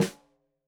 Snare Stereo, Drums; Deeply Sampled Drums; SMDrum

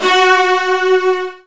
F#2, Virtuoso Strings; ILIO; Kirk Hunter Virtuoso Strings VOL-2

